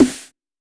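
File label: TR909 Snare, Roland SH-32 Drumset; Drumset 03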